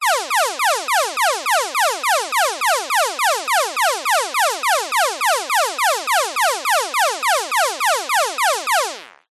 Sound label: emergency alarm siren fast danger warning digital